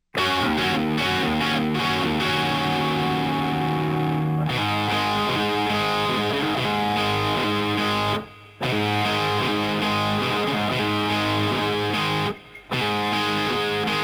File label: Guitar